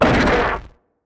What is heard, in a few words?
attack; Barotrauma; Hammerheadgold